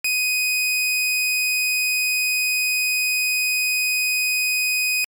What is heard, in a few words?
2.5khz saw -20db; pure test wave; AAC CBR 320k 16bit 48khz